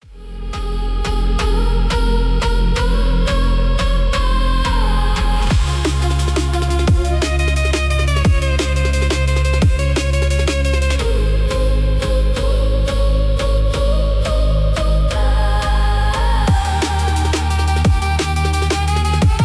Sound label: drum and bass